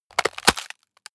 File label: bone break large slow